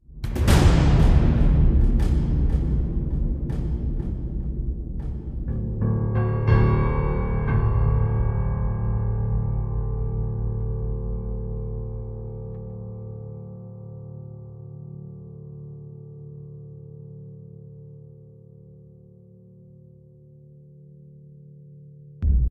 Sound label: Opening Ambience; Assassin's Creed III